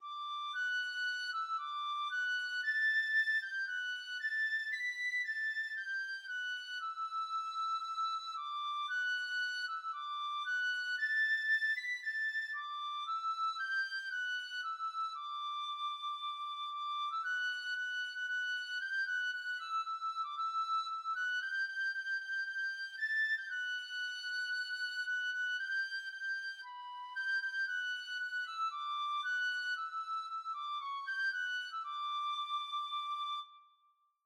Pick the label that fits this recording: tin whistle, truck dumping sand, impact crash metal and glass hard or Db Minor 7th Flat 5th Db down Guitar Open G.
tin whistle